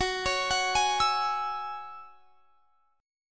Gb 7th Suspended 2nd Gb; x,21,23,23,21,24; Listen to Gb7sus2 arpeggiated

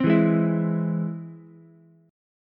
E Augmented, Ukulele; strummed; 5,4,4,7

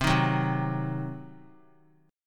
C Diminished C, 3C,3F,3A,3D; strummed; JGuitar

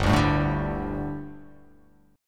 G Suspended 2nd G, down, Gsus2 Chord; strummed; JGuitar

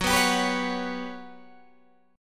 G Major 7th Flat 5th Gb

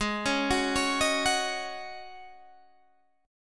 G sharp 7th Suspended 4th G sharp, Guitar DADGAD

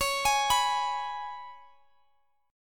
Ab Minor Double Flat 5th

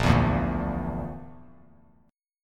A sharp Major Flat 5th, Bass Standard; strummed

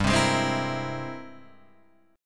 Ab Augmented Major 9th G, guitar

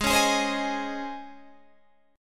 Db Major 7th Suspended 2nd Ab

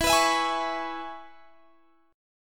E 6th, down, Guitar, Standard; strummed; capo 9

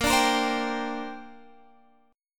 D 7th Suspended 4th Sharp 5th, chord

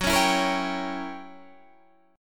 Gb 7th Flat 9th Gb; 14,16,14,15,14,15; Listen to Gb7b9 strummed

